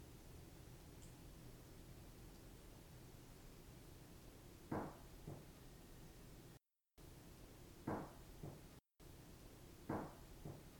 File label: Knock